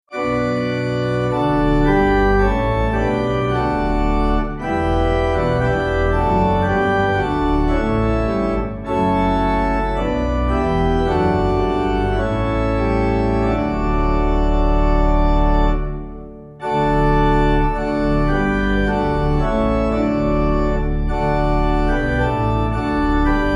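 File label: pipe organ; Father We Praise You; hymnary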